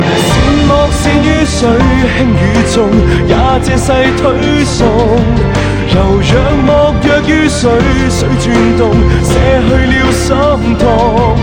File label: Cantonese Song